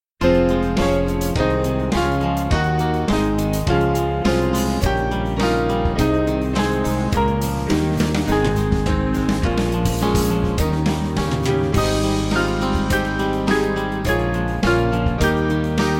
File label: Jesus With Thy Church Abide, Band; Hervey